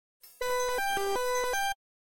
Jingle; Chiki Chiki Boys; Genesis

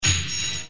clang

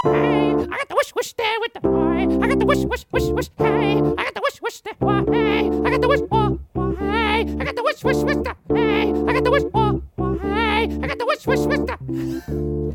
Whoosh Whoosh

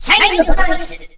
ROCK

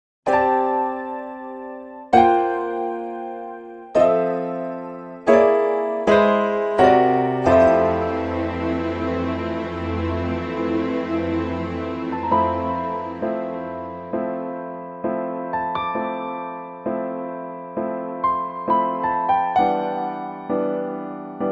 gentle rain, Chiptune; Mother 3i

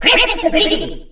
ROCK

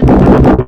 Big Explosion; mm7sfx

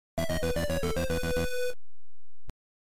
Game Over, Chiptune; Gameboy; Castlevania - Harmony of Dissonance; Nes Version

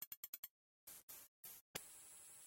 Jingle; Sega Channel Demo Cartridge #4; Genesis

